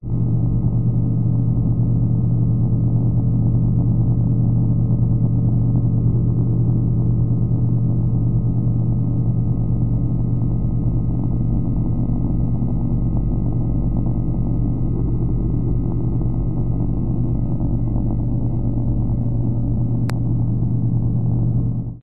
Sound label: science fiction ship power room; Audio Mangler